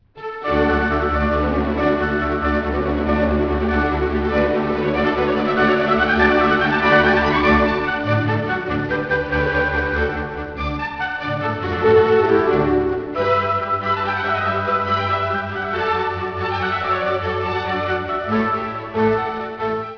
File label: mozart piano